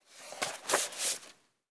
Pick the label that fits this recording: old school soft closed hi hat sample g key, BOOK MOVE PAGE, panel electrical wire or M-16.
BOOK MOVE PAGE